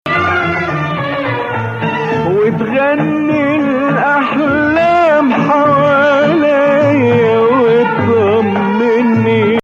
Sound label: Nawa Hijaz; Maqam Nawa Athar; Hijaz 5